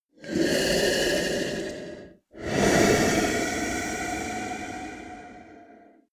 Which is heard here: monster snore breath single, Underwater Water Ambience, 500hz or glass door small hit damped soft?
monster snore breath single